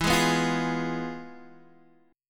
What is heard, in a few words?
F Diminished E; Fdim/E strummed; JGuitar